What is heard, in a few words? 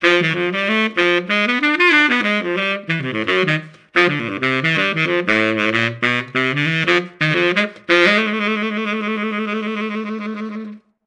Tuba